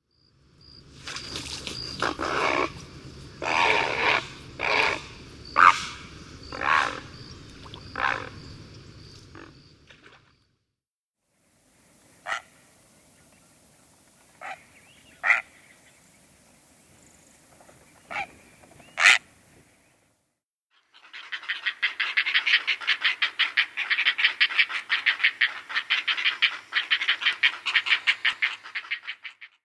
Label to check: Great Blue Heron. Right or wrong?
Right